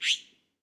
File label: sfx